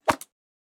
arrow swoosh

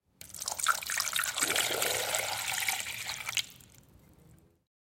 pouring water; soundscrate

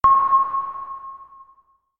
sonar; soundscrate

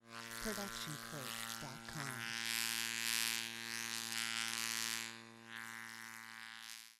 Insects Flying Buzz; SoundsCrate

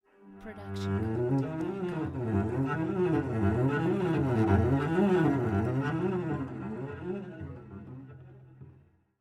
Cello Whole Tone Runs; SoundsCrate

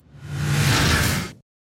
ghost sweep; soundscrate

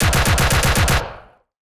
Automatic Weapon Fire

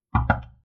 wood door knock outside twice

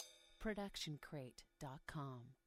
ride cymbal 20 inch bell; soundscrate

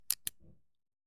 Cowboy Revolver Cocking; SoundsCrate

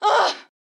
woman injured grunt

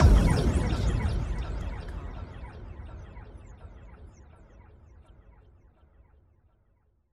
sci fi impacts

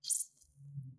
blood squish

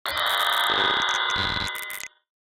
Horror Glitch Radio Signal; SoundsCrate

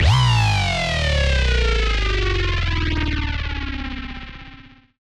Bass Drop; SoundsCrate

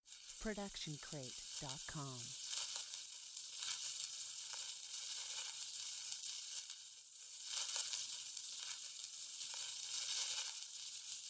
Magic Portal; SoundsCrate

